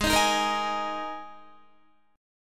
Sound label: Ab Suspended 2nd Guitar; strummed; Absus2; 15,18,15,15,15,16